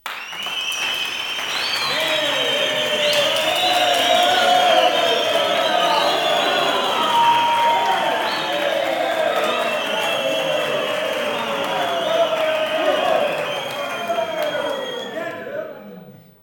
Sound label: CHEER; Spectrasonics; Heart of Africa 1